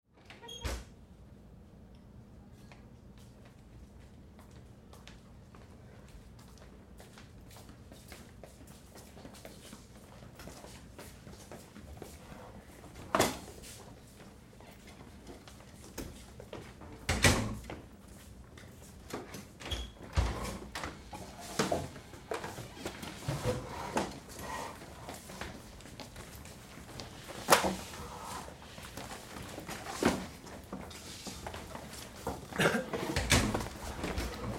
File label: Activity Class Students In Sit Down; Schools; Sound Effects:Backgrounds; Big Room Sound